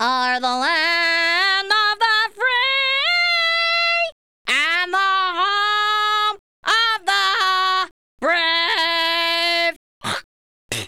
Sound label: fat pig